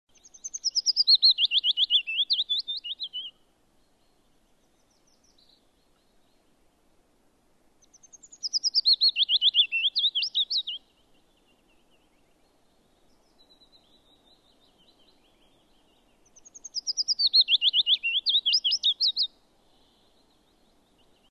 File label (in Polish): Piecuszek, Ptaki Glosy